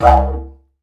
Didgeridoo Kit Samples

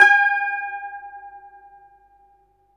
SITUR G5; Spectrasonics; Heart of Asia

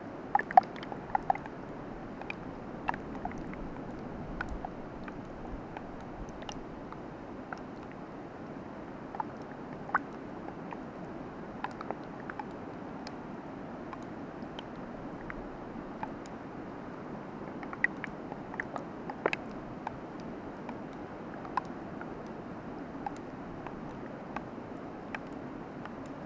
Water Drip Melting Snow Drops; water sounds

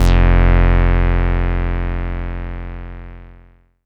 bass F1, Moog Minimoog

